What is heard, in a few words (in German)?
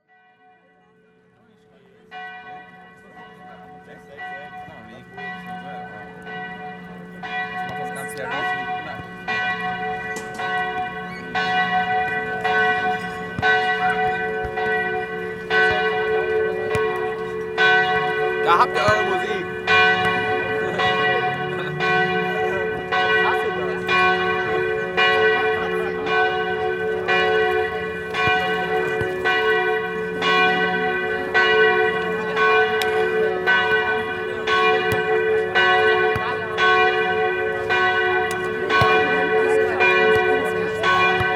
Kirchenglocken; Weserterassen